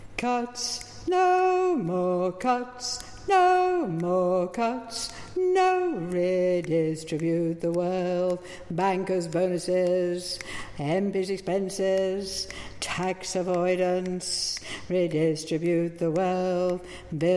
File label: bass